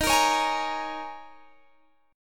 G sharp Suspended 2nd Suspended 4th, guitar chord; x,18,20,18,21,18

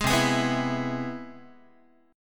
C Augmented Major 7th, Guitar; strummed; JGuitar

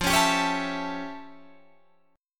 F Augmented Major 9th, Guitar, Open D

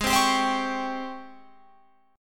G sharp Major 11th G sharp, Guitar Open F; G#M11 Chord

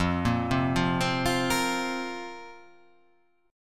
F Suspended 4th F

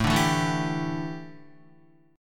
A 7th Suspended 4th, A, down, Guitar

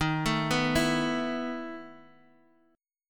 E Major 7th Suspended 4th D sharp

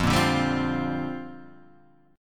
A sharp 6th Add 9th

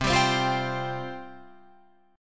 C Suspended 4th C, Guitar Open F; Csus4 strummed; 12,14,12,12,15,14

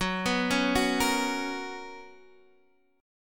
B Major 7th Suspended 2nd, chord

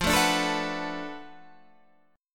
B Minor 7th F, chord; Bm7/F strummed

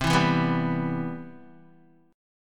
C Major 7th, Guitar; CM7 strummed; JGuitar

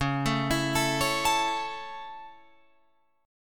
Db Minor Sharp 5th Db Guitar Open F; arpeggiated; Dbm#5; JGuitar

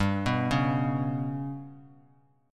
G Major Flat 5th, Bass Standard; arpeggiated

